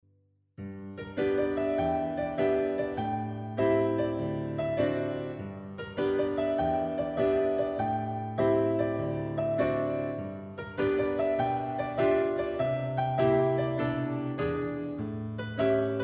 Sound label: moderate blues